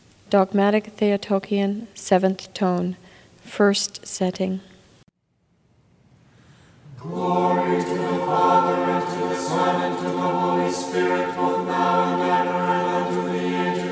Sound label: Dogmatic Theotokion Tone 2; Setting 2